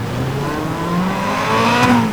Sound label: first, vehicles cars; lwcars